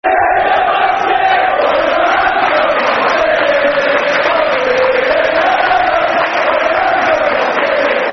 When The Rams Go Marching In, Football Chants; Derby County Dcfc

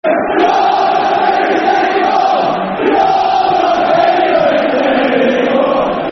You're Not Famous, Football Chant (Soccer Song); Doncaster Rovers Drfc

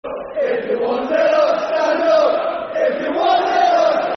Football Chant (Soccer Song); Stand Up If You're One Nil Up; Coventry City Ccfc

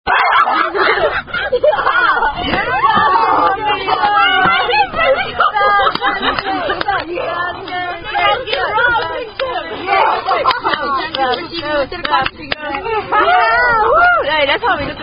Drinking Game

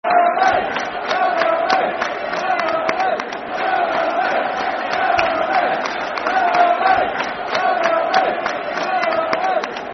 Fulham Army, Football Chant (Soccer Songs)

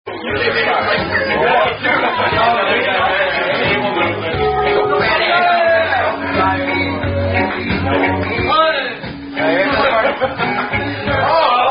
Drinking Games

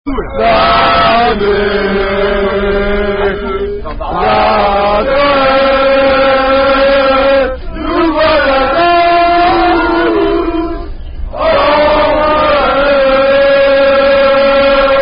Chanson De Rugby, French Rugby Song

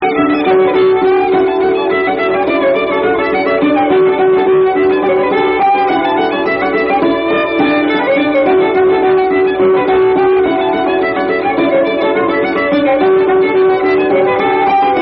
Hornpipe; Swan Ricketts Hornpipe

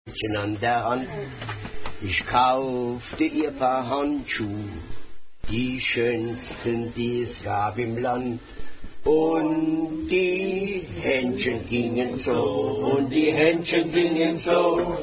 German Drinking Song; Unknown German Drinking Song

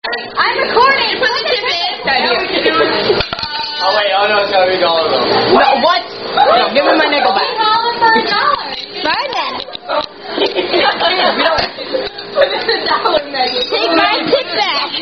Song; Unknown Coldstone Creamy Tip Song

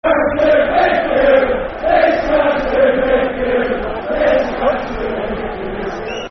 This Ground's Too Big For You, Football Chants; Wolves; wolverhampton wanderers; fanchants

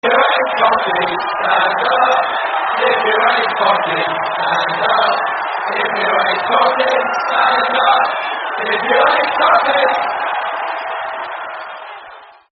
Hate Cockneys No Cheer; Barnsley; terrace chants

